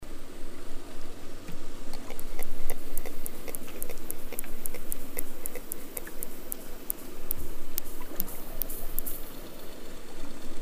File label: Echolocation, whales; Lama Pass; Killer Whales (Orcinus Orca)